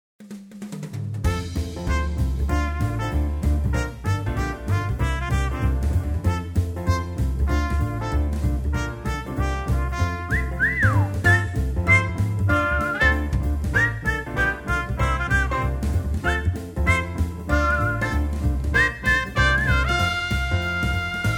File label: Swing Jingle